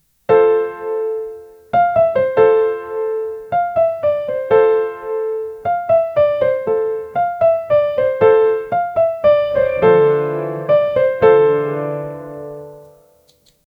romantic piano dancing

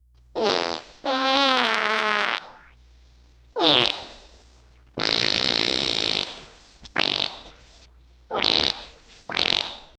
rapid fire and loud wet, comedy

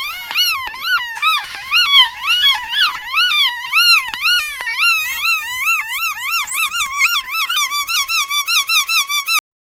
ultra realistic group squ, mammal

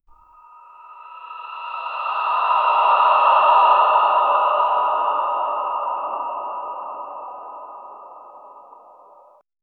a soundscape of gently, meditation

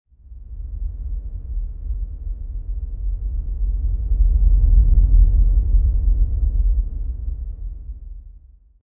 epic cinematic desert aur, desert

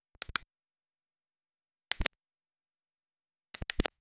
camera

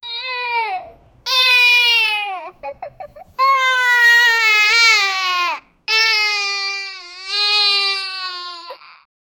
child crying

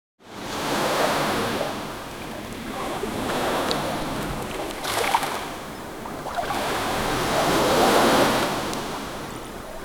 swimming in the sea; sound effect

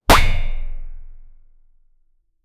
punchy cartoon impact; high end

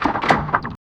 the sound of the tracks, tank

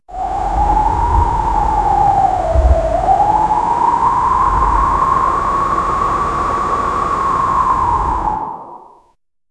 wind effect hit human sim

